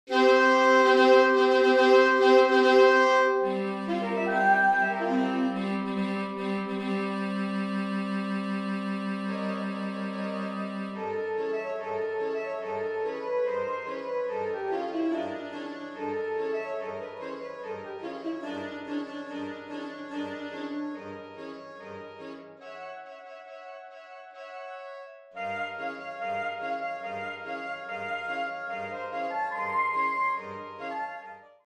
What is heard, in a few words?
Suite for Saxophones March; Stravinsky